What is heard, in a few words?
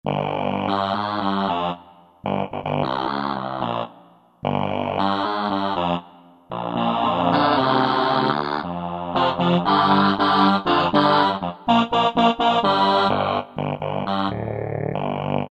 Yamaha TG500, CH Vocod